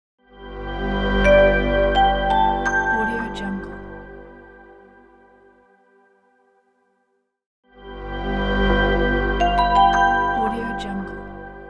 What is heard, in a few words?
calm logo